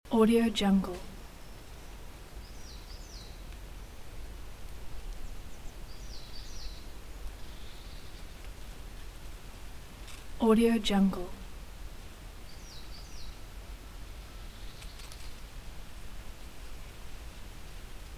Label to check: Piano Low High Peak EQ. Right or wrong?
Wrong